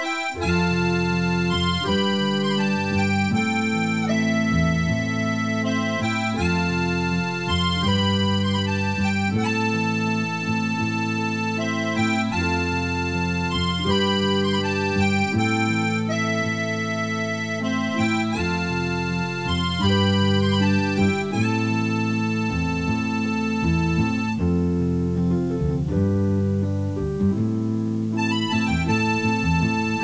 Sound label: music